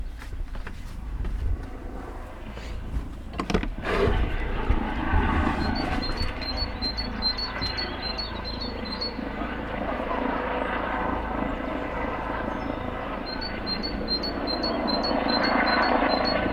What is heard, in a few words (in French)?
AMBIANT hélico oiseaux